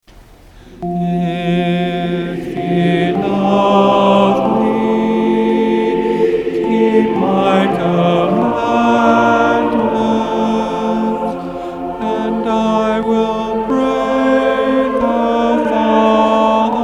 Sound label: Soprano both